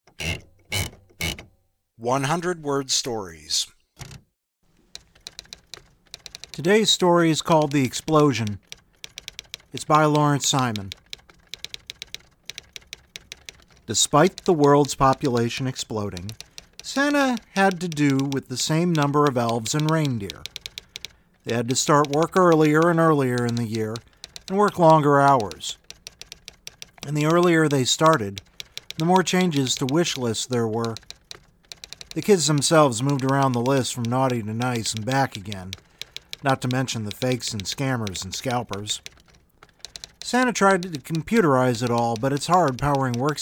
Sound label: the explosion